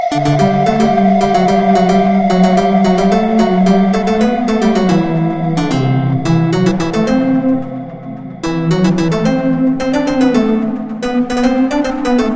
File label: Ringtone